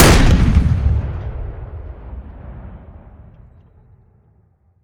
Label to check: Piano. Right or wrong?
Wrong